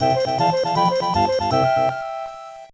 Level Complete!; shrek hassle at the castle; gba

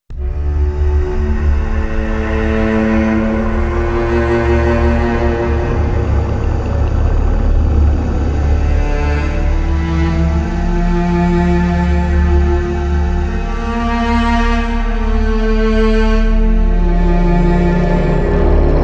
Easter Egg Stinger; Zombie DLC5 - Tomb

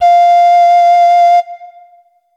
Instrument samples; peppa pig world adventures

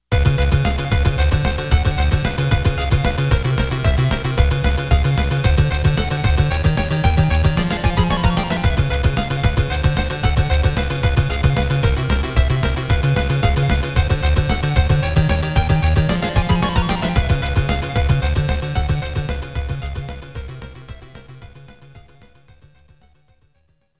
Boss Room; Esper Dream; memorial best

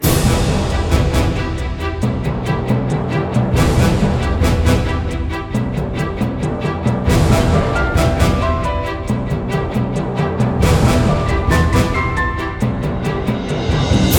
Boss Battle Intro

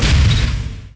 Ambience Press Sound

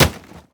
plastic hit; teardown; ps5 windows xbox series xs

